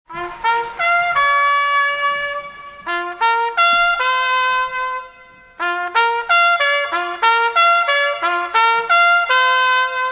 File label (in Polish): hejnal